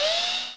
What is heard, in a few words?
Drill